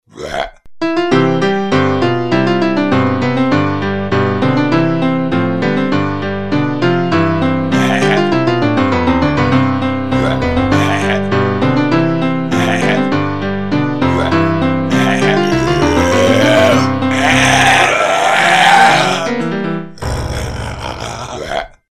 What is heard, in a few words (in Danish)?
klaverbokser